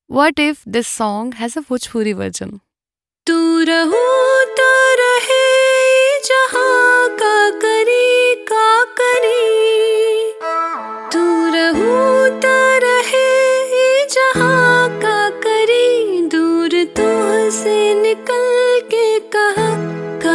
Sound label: gujarati